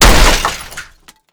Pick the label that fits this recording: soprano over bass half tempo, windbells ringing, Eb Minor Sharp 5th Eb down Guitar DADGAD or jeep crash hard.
jeep crash hard